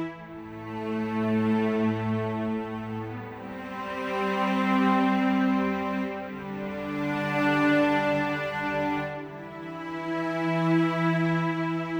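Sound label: Cello ensemble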